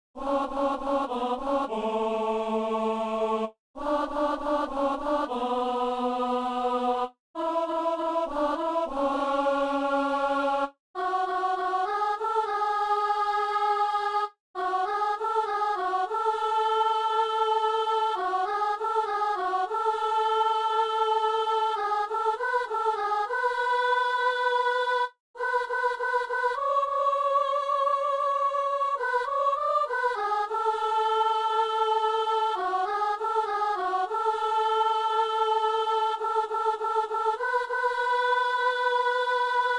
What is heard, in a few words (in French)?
soprano; Messe du 17 janvier à Saint-Denis